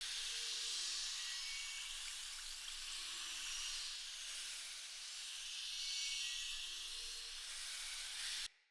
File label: stag wild exclaim stag loop; Powers; Stag Pact